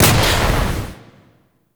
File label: Missile Shot; Warframe; Veilbreaker; Venkra